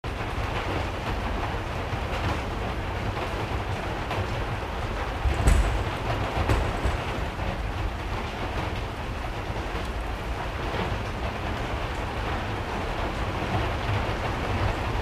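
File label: rain int, ambience stereo; mohaa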